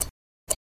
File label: Count Down Special; Blockman Go; Android